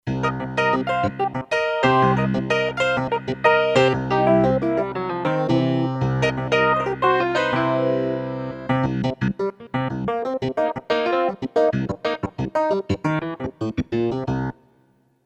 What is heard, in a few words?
Funky Piano, Kurzweil K2500X; Factory Programs